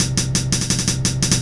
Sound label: Hat 170, Perc Loops, Drum&Bass; EZRollers